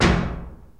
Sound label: DOORSLAM; Crypton - DrumBase5000